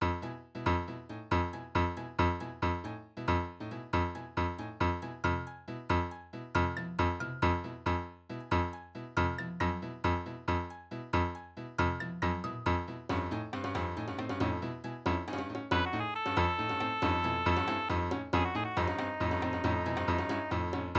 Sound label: MIDI BGM Africa